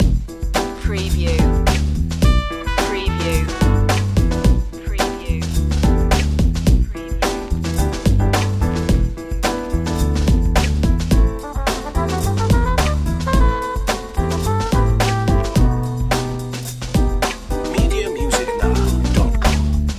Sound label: cool acid jazz loop